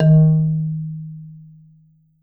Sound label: D#2, Marimba